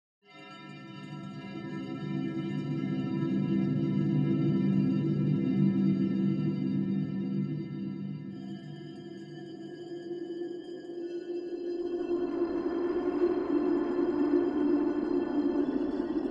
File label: Padshop 2